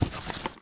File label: Luggage Open